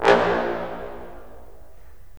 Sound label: BRS, Roland LA Composer